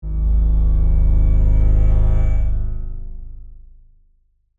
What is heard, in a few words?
Synth, Stab, Reverb; Stab Sound Effects; Sound Design; BlastWave